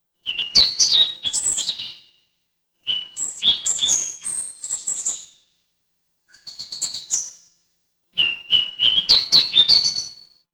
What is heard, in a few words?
ambience forest